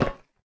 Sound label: metal hit ground